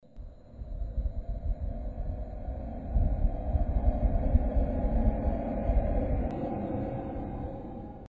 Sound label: wind whistles